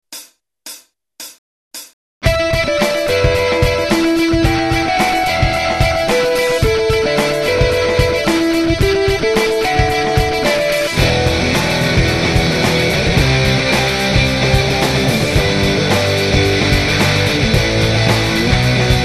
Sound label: guitar